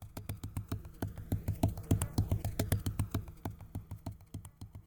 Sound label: run; credits